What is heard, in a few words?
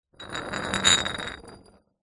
bottles glass beer empty x2 slide on concrete surface